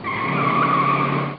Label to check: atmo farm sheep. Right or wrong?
Wrong